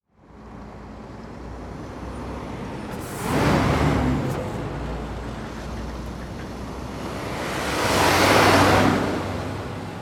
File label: Hard Truck Traffic